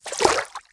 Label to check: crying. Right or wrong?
Wrong